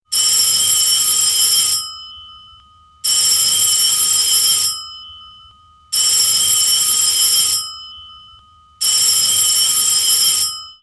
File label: france old phone; ringtones